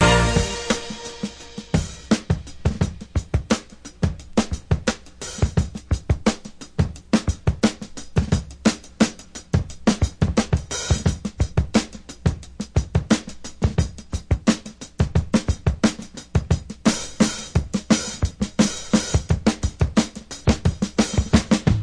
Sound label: 088 bpm one man band plays all alone, drum loops and breaks